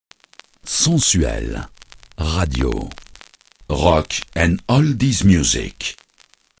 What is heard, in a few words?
jingle; sensuelle radio